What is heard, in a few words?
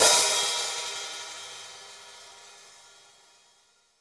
hihat - opened; JazzFunkKit